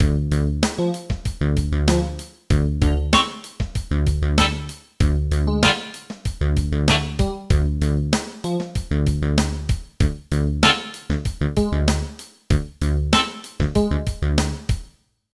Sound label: menu Music